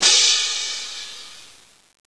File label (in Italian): PIATTI